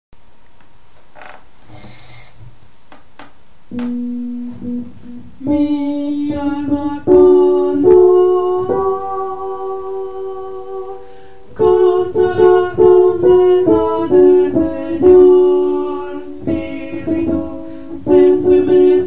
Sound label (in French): basse; Chants focolari en d'autres langues; mi alma